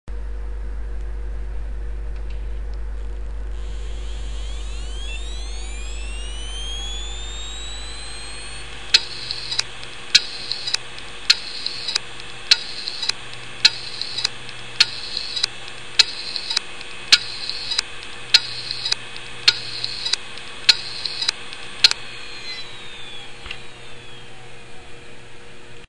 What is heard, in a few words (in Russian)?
Stuk vinchestera IBM vyzvannyy neispravnostyu bloka golovok